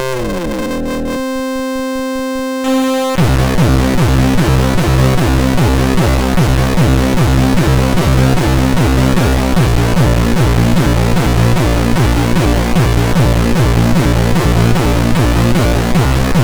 Intro, MOS6581R2

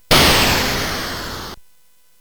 Synth Sample, CSG8580R5; hvsc

